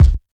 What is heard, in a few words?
raw kick drum single hit c sharp key, Royality free bass drum one shot tuned to the C# note